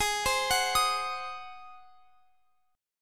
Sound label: G sharp 7th G sharp, Guitar Open F; G#7; x,x,20,19,21,22; arpeggiated